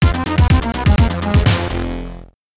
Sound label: Level 2 Game Over; Logical; Amiga